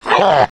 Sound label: monoculus laugh; npc